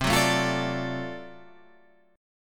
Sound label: D 7th Sharp 9th C, chord